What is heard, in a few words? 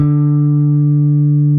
bass